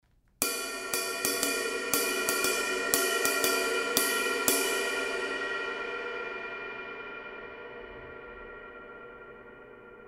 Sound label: Kerope Medium, cymbal sound